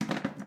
Plastic Chair Stand

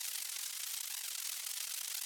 Teleport Static-Off

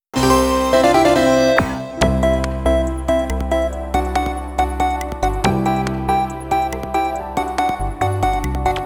Clear Intro; Boxboy! + Boxgirl!

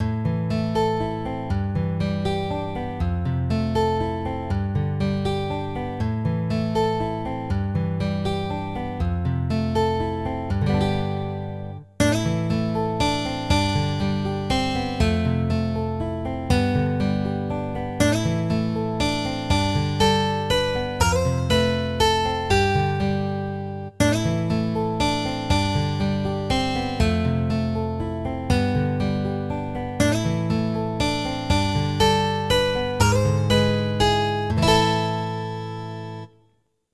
guitar

